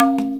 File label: NAGARA; InVision Interactive